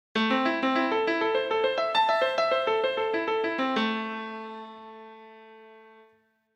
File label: Am arp, keyboard